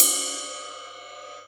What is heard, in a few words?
Yamaha EX5, Drums